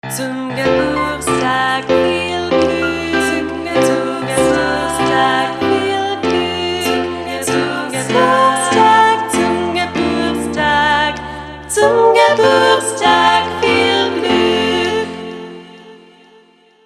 Happy Birthday Song in German Piano

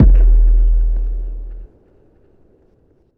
Epic kick, Kick drums

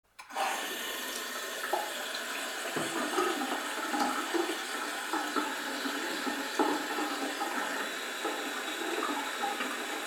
Sink tap running

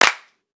Hand clap

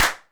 Plug clap, Drums Claps

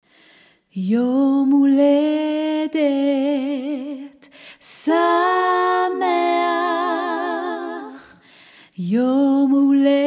Happy Birthday Song in Hebrew

